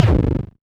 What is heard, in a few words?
kick rough pulse; pro5